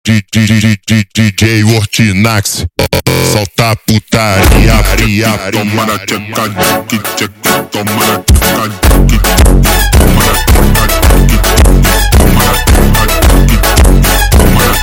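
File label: super Slowed, phonk music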